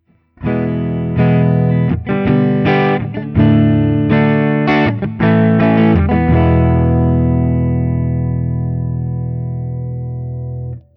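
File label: Guild S300AD ODS100 Open, guitar; sunburst Guild S300AD guitar; Open Chords #2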